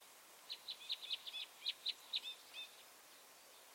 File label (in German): bindenkreuzschnabel